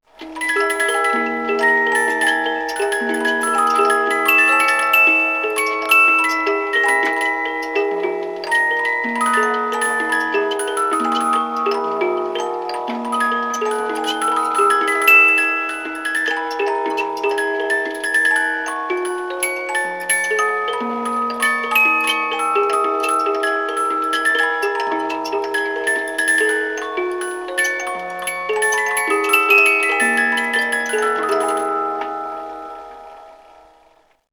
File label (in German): Spieluhr; Ring of Steel